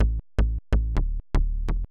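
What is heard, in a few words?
synth bass, house, 125, A; eJay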